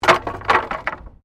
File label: Wood Fall; Material components for constructing more complex sounds; Wood (Sound Effects:Materials)